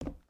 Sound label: Foot Wood Boot Walk; footsteps